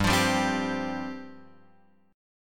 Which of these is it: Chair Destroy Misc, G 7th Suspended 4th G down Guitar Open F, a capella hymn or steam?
G 7th Suspended 4th G down Guitar Open F